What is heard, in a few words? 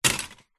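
leisure toy drop into toy box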